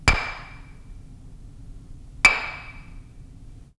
Reverb Brick

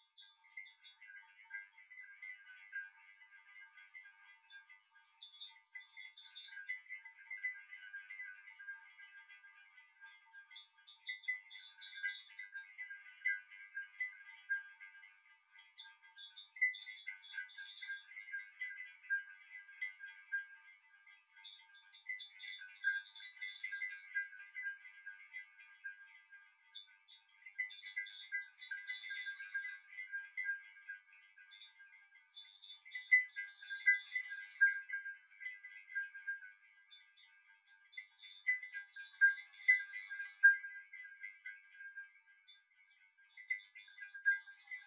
Creek, Garden

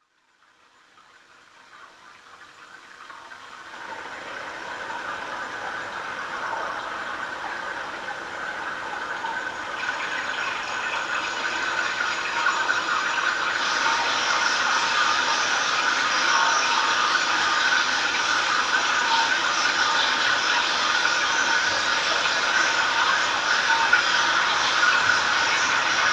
Trickle Locks; Chalice Well

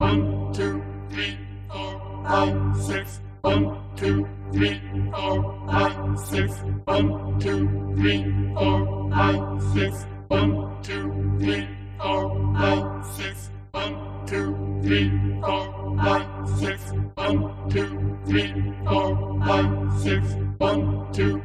phasor

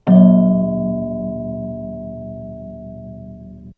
chord

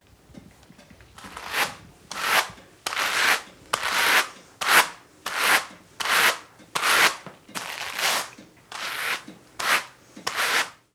Carding

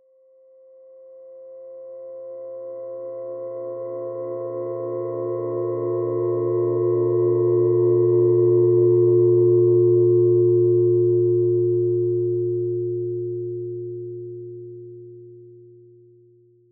Hit, Earth & Steel

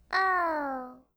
Aww

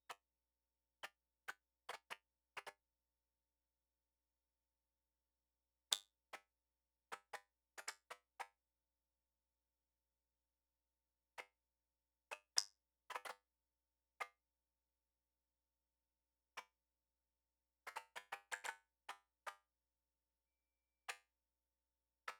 Creek

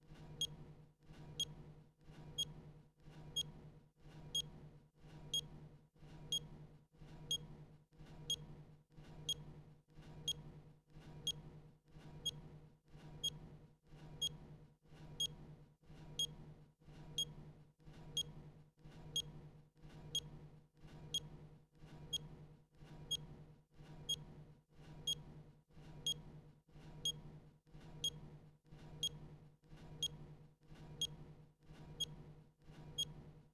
Robot Hear Beep

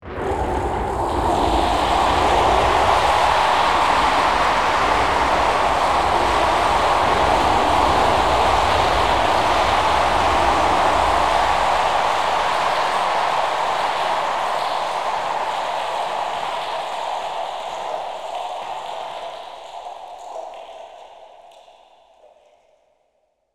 hard rain

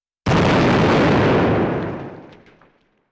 Explosion - debris falling

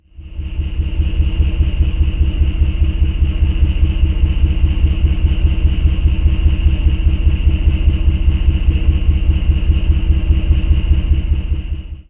Ventilation Duct

